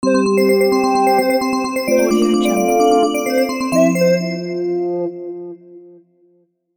news logo; alexm76

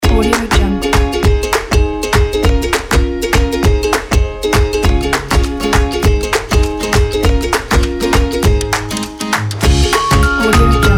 happy

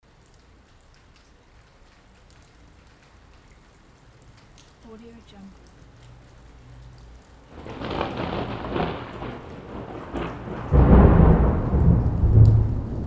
thunder loop

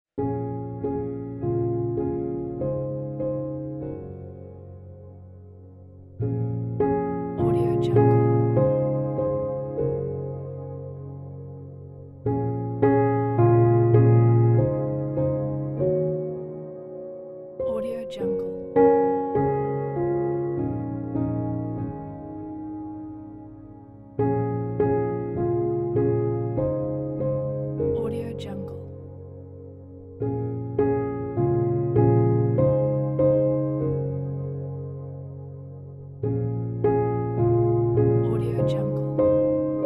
soft ambient reflective piano

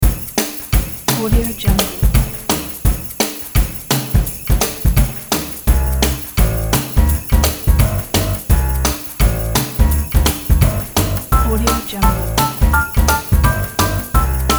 happy christmas jazz

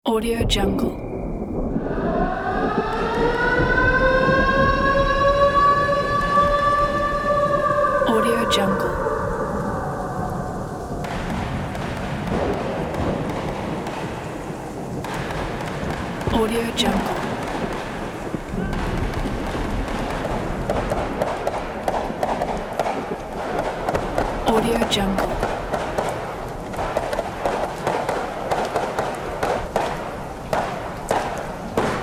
war ambience

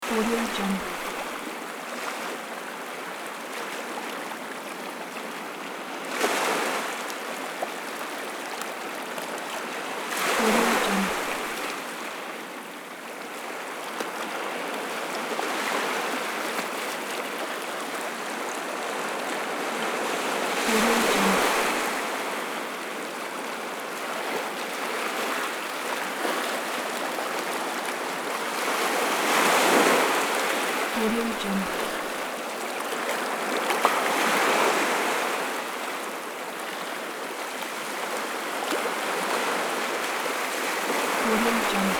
waves breaking

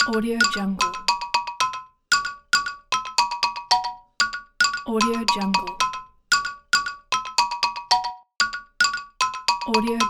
many mallets ringtone

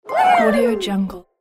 happy voices woohoo

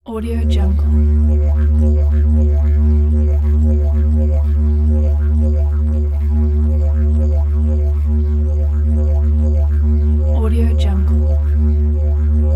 real didgeridoo drone in D; michele fortunato

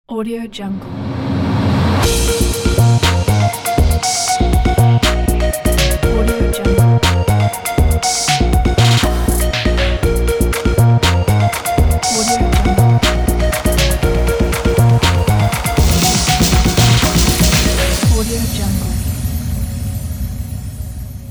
epic industrial logo intro

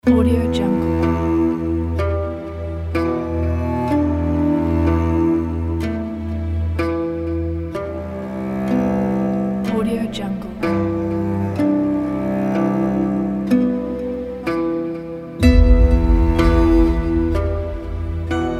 dark forest

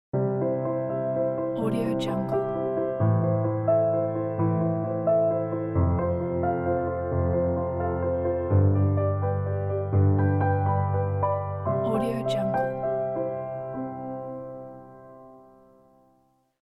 classic piano logo

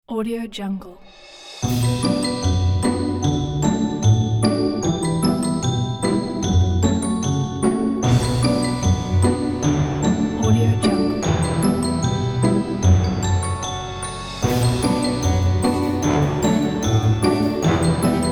circus

